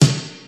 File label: snare d key; 80s; snare drum samples